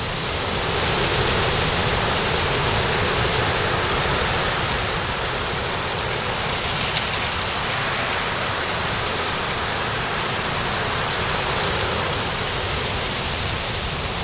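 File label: city ambience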